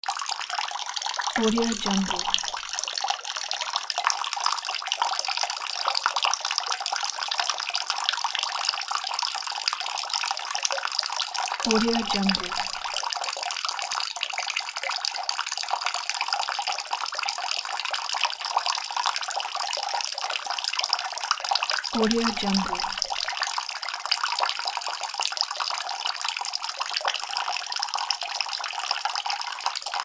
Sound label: running water